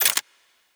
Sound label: Weapon Foley